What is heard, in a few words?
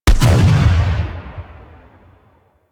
Shotgun Alt Proj Explosion; Weapons; Revenant; Warframe; Tenno